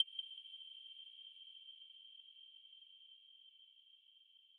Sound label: arp